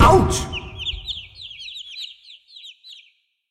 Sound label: tank hit; Rescue Team3